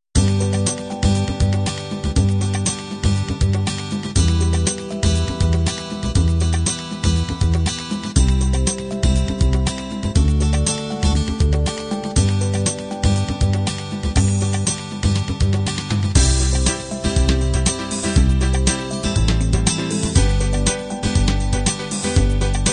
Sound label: unplugged 16beat